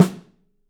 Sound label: Drums, STEEL; 3D Drums